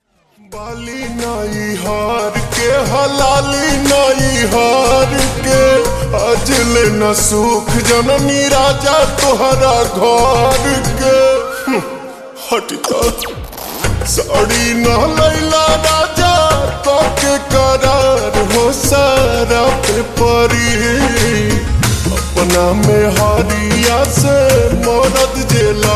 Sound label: Slowed Reverb Ringtone